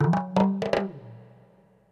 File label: PERCLOOP ETHNO 125; eJay